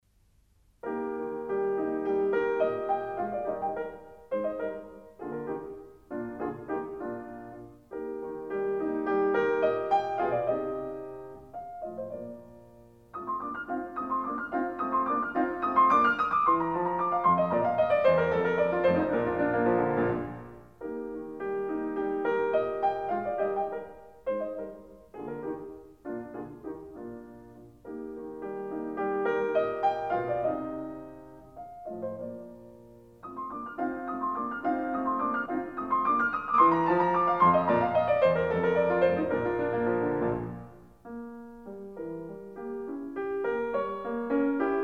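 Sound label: Piano Sonata No. 4 in E flat major; Wilhelm Kempff; Beethoven; Piano Sonatas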